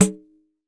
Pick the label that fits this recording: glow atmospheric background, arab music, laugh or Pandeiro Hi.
Pandeiro Hi